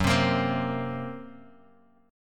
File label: F sharp Major Flat 5th F sharp; F#Mb5 Chord {2 3 X 3 1 X} strummed